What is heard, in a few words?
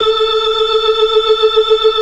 FAST VIB, ORGAN, TREMOLO VIB